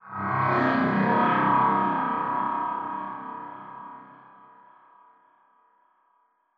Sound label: strider distant; ambient; combine